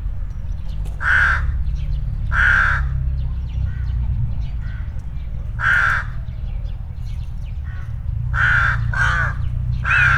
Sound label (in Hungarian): vetési varjú, egerészölyv is; Hortobágyi Nemzeti Park